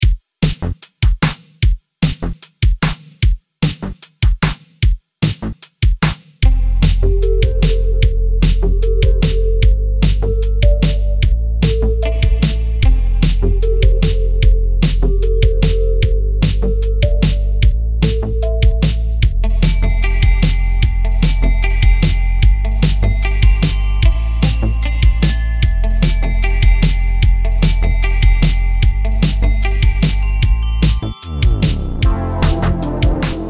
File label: Instrumental; rockpop